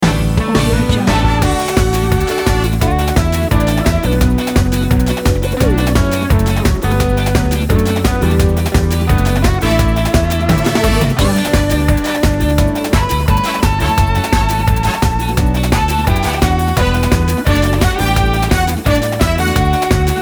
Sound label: carnival land ident; flaviocortizo